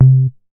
Moog Cat; leo-sounds

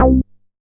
Moog Face; leo-sounds

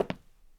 FOOTSTEPS WOOD

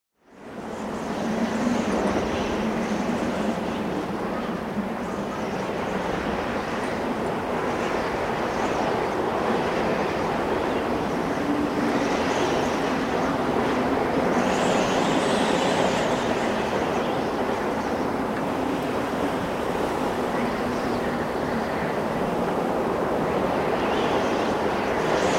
ghost town wind

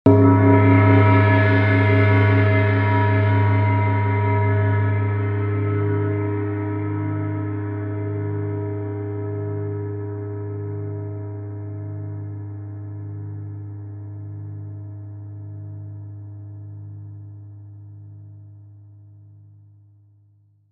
PERCUS

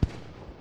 fire, fireworks; baseball

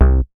Moog; MoogAplex

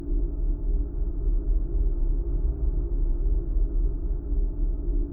portal sound; overte

